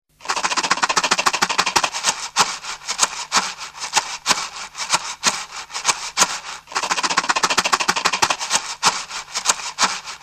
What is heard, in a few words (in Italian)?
tamburo a sonagli